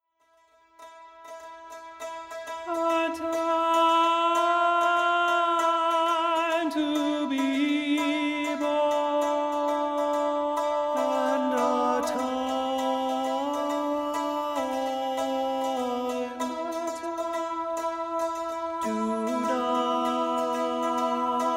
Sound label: motet; ecclesiastes